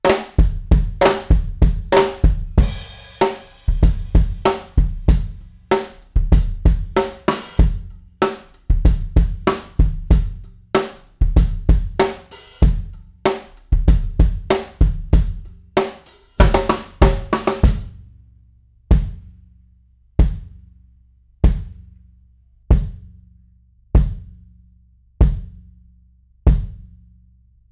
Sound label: Kick fig 8, drum; drum shootout